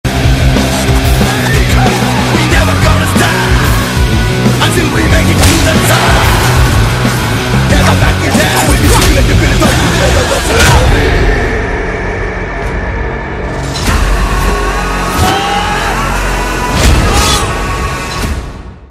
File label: malayalam bgm ringtone; rdx movie; rdx teaser